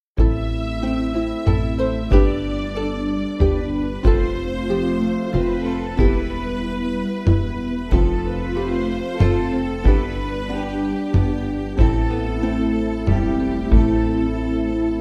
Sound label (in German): Harfe Kontrabass